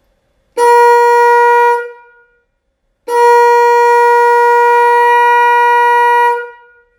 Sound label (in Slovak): klakson 12v elektromagnetická singel fanfára nízky tón 320mm